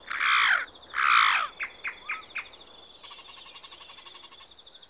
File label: night bird; EnvSound